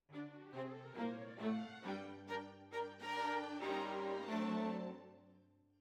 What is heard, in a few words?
Violas, jazz for piano